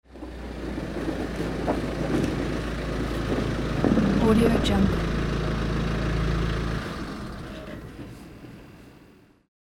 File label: car stopping